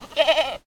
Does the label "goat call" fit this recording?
Yes